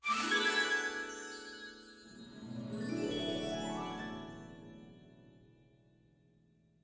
fairy Intro Appear; fairyhansel

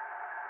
reel Spin Free Spin; wild turkey

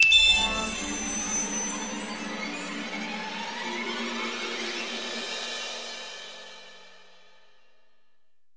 candy Win Start; fairyhansel

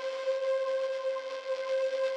Pot Loop Glow; godsoffortune

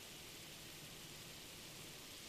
reel Spin; colossal pinatas

